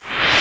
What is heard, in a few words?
Window Open